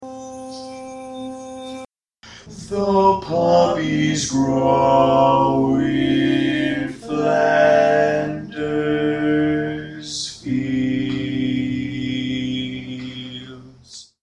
Bass, Barbershop Tags

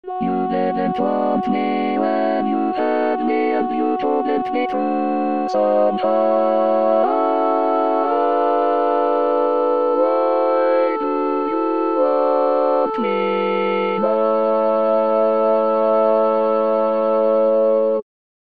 Tenor, barbershop

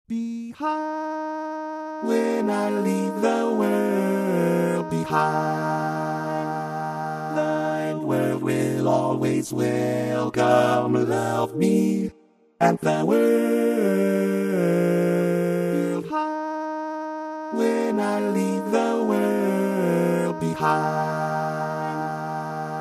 barbershop Bass

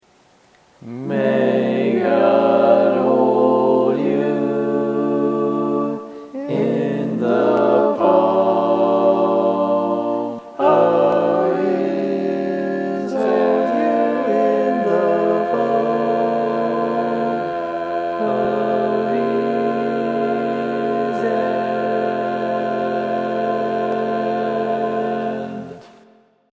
All Parts, barbershop

